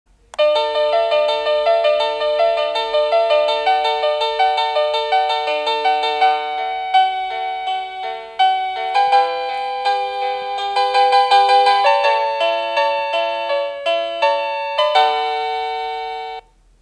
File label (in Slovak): Ukážka melódie zvonenia zvončeka